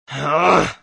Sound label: Growl; Sonic.EXE